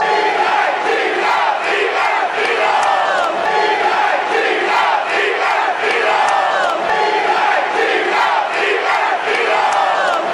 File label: e g l e s eagles, fan chants